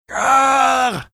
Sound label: arrgggh